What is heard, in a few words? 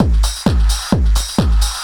drum 130 groove; Techno Trance Essentials